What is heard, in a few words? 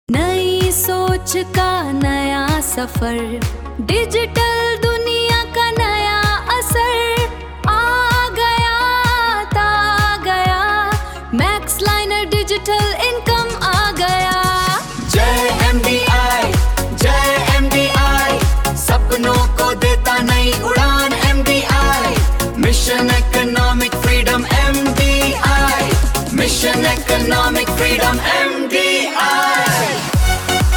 Ringtone